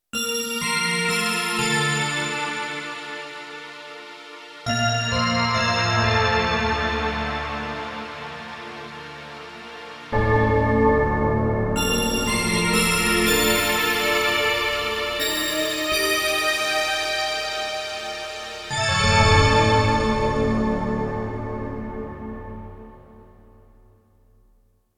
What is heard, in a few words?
Tibet Bell, Roland D-70; Factory Performances